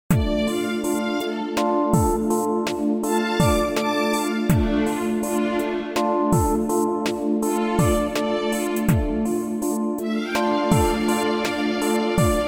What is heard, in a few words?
modern piano minus